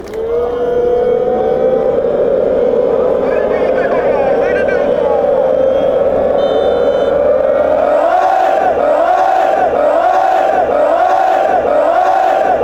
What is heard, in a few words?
heeeej, fanchants